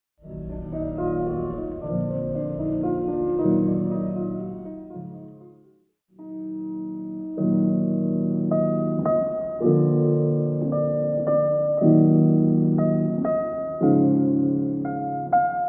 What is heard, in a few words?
piano montage; Dark Fall The Journal